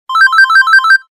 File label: Galaxy Beep, Ringtones; KE970 Shine; LG